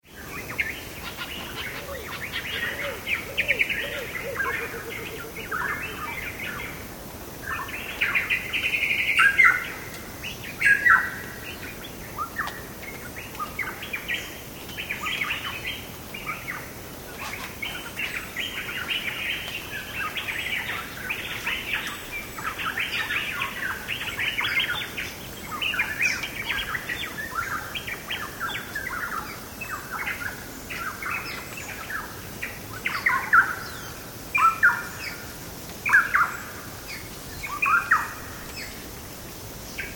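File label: Tropical Birds and Insects - various bird calls; insects fly past - some foliage movement - wind in tr; Jungle; Tom Hutchings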